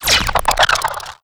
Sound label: bolt huge zap